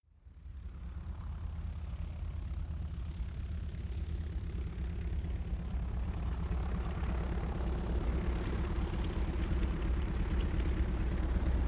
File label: propeller plane ju52 roll and take off medium